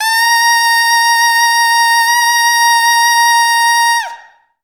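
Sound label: oboe A#4; Best Service; Peter Siedlaczek's Advanced Orchestra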